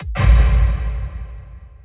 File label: kicksplosion